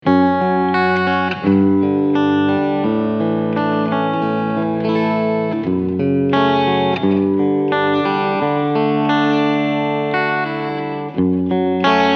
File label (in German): Hall